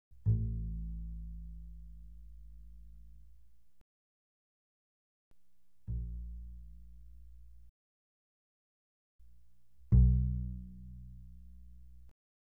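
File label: Bass pizzicato mezzo forte sul A A1 B1, doublebass; Strings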